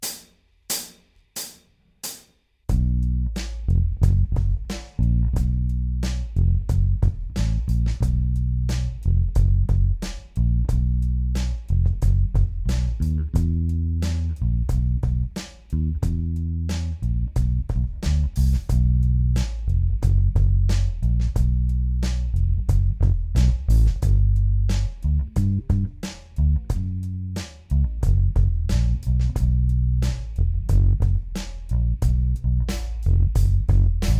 Aria Pro II PJ - jazz bass & precision style both full ON & Tone full ON; Preci-Bass-Vergleich